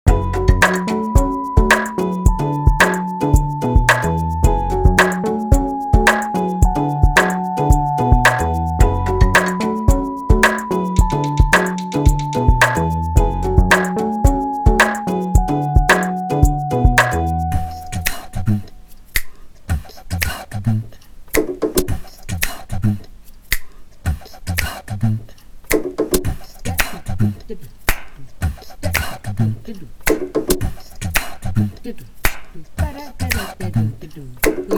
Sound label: Hip Hop Breaks